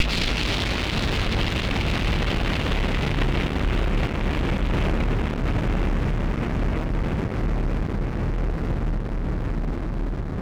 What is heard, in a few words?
Drum Bomb; musicradar; bomb factory; Drum Bombs